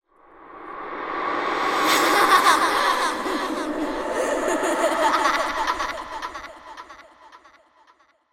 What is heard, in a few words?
horror evil child laugh reversed reverb